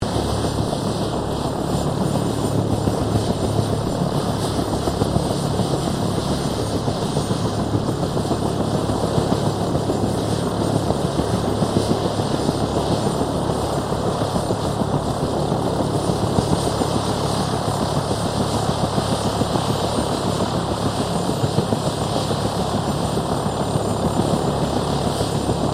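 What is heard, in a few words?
helicopter Bell UH1 Iroquois idle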